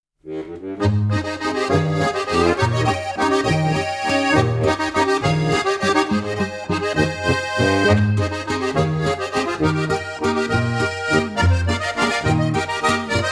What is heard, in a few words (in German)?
Harmonika